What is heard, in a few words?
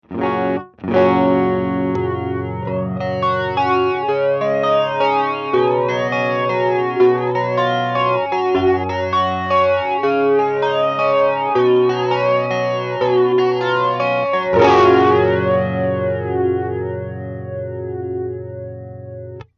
Flanger BF-2 Sound